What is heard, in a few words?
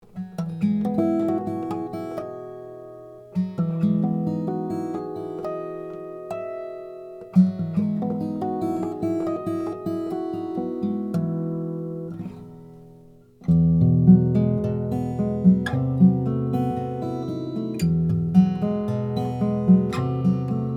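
2012 froggy bottom c deluxe, guitar; bastogne wa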